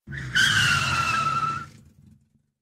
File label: Car Braking Skid Sound